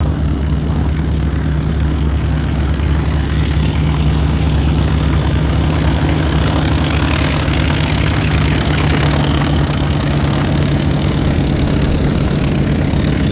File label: single engine airplane taxiing pass by; aircraft